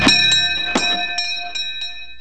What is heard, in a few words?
clue bell; nippersounds